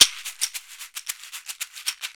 Caxixi Beats; Roland LCDP14 Africa VOL-2

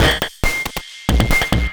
percussion loops, 138, Techno

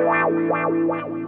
GUITAR; InVision Interactive; 40 Oz Phat Slammin Hip Hop

